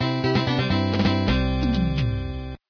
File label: complete; asciisec